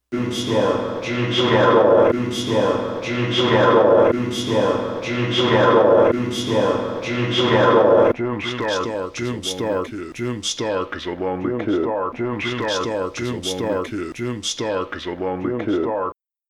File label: Manipulated vocal piece.; A random line from Danny Peary's book Cult Movies, his essay on Rebel Without a Cause, is read aloud into a mic